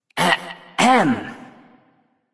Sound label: clear throat; smite; ahpuch